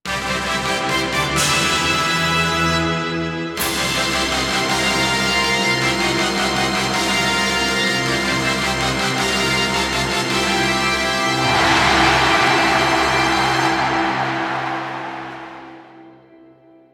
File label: Music Event